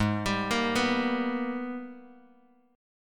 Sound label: Ab Minor Major 9th, Bass; AbmM9; arpeggiated